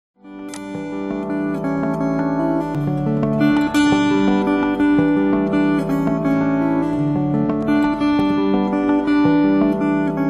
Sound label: nursery chimes